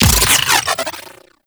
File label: Redline Projectile Launch; Warframe; Runner